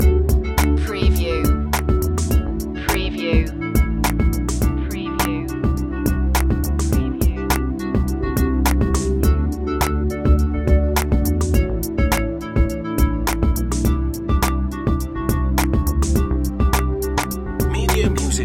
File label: downtown groove loop